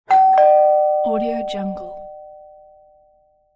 door bell

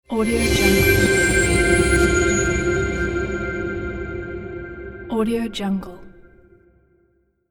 atmospheric ethereal transition

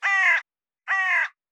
bird crow

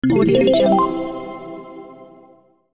inspirational score

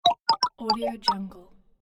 funny transformation

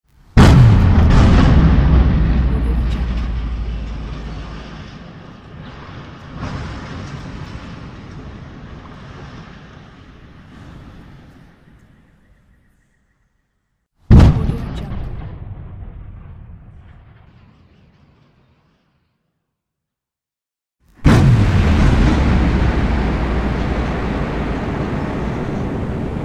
destructive explosions

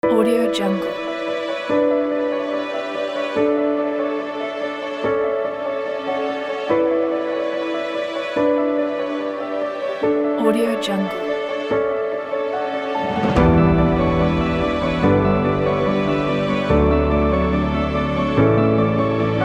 dubstep epic

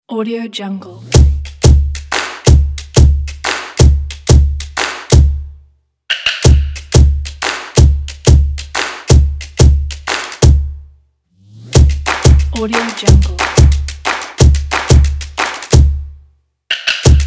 percussion

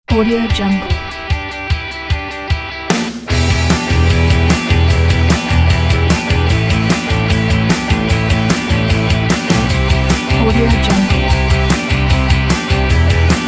road guitar

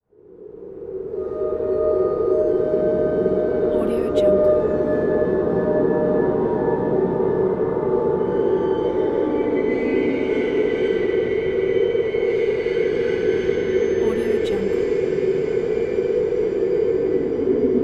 horror ambience

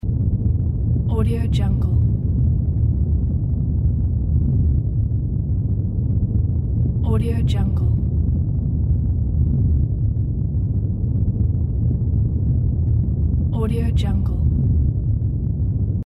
earthquake

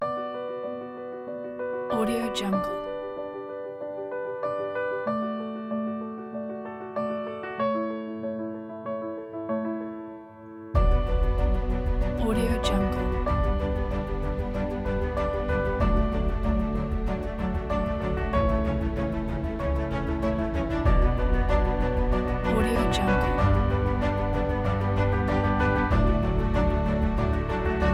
a dramatical classic pack

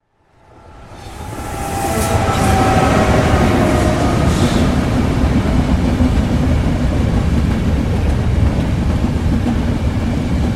train passing by

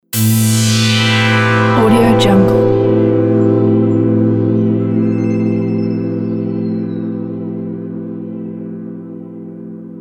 soft ambient logo

